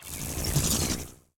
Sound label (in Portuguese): Bolapequena Faisca; Super Mombo Quest